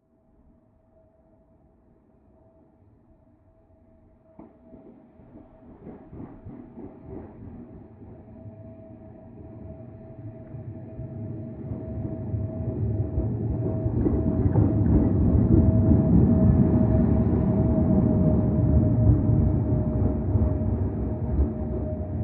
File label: train locomotive drive by distant long